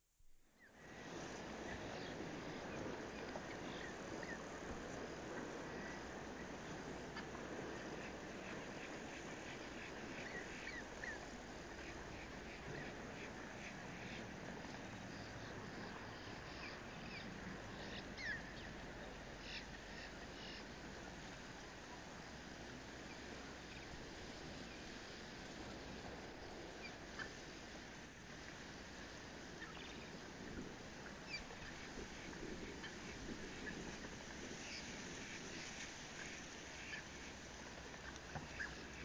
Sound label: Ambience